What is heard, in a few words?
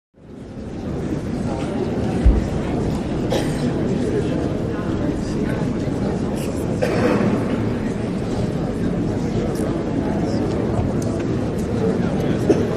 Stadium Crowd Buzz, murmurs; murmurs various